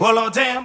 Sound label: well oh damn SUNG; Voice; damn god damn